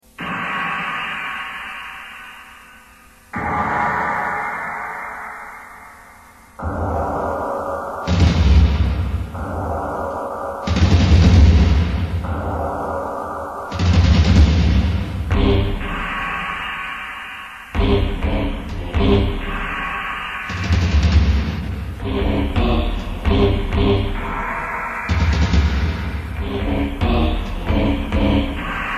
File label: kurzweil 250 orchestral percussion